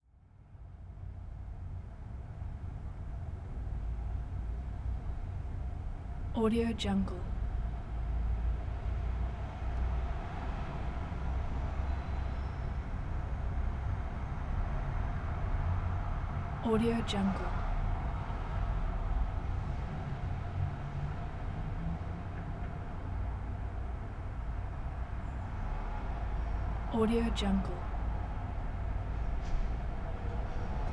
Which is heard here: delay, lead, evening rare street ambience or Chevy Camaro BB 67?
evening rare street ambience